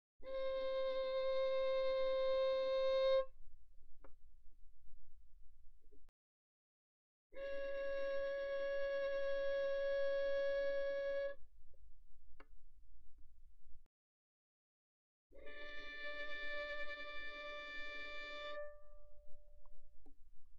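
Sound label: Violin arco pianissimo sul G C5 G5; Strings; violin2012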